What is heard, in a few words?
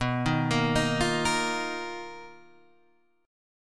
B Major 7th Flat 5th B, Guitar, Half Step Down